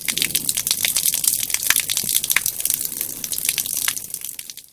blood drops; svencoop; sence; source of life

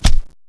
player pain; svencoop; sence; mistake coop

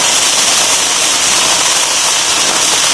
buzzer pshhhh; svencoop; sence; mistake coop

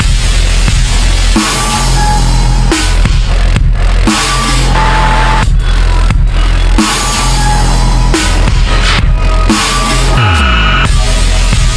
demon house loop; svencoop; sence; source of life

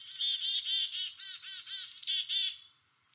random jungle; svencoop; underrain

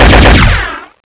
oicw fire; svencoop; scshogo

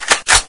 glreload; svencoop; hl2; oicw

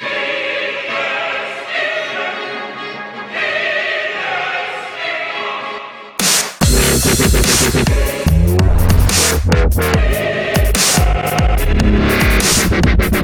boss intro theme; svencoop; sence; source of life

